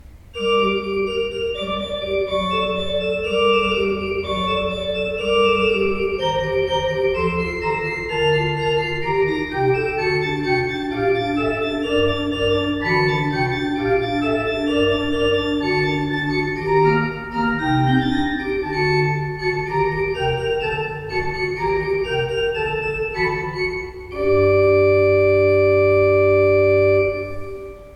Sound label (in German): Stahlhuth, gebrauchtorgel